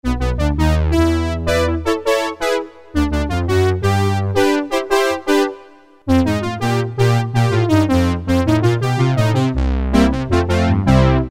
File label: Brass; Factory A